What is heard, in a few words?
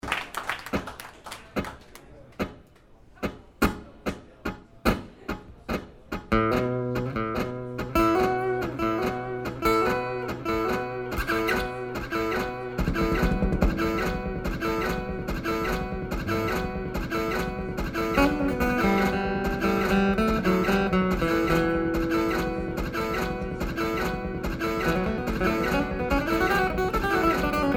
Instrumental